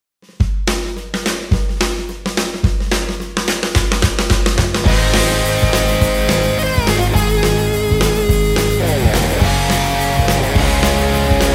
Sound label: Cover Band